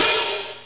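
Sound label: Hi Hat Open; Instrmnt; Sound Library 2000; Wayzata Technology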